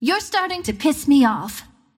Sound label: attack pain; Windrunner